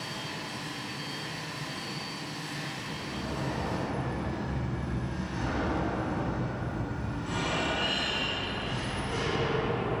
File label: Mines Machinery Atmos